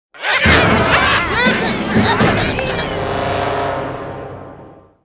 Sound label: level start military; Angry Birds Space